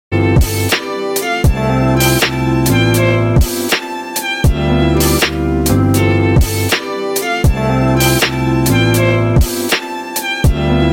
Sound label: Chill Study Beats relaxing